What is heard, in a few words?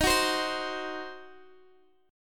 D sharp Minor 6th D sharp down Guitar Open G